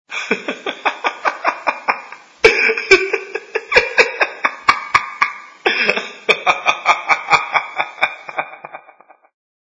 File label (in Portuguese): Vinhetas Risada de louco